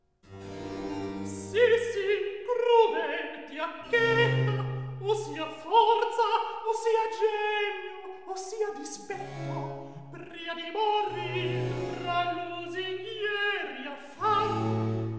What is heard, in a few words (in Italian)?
Recitativo; Il duello amoroso HWV 82; DalisoAmarilli; Si, si crudel; Handel Masterworks; Klassika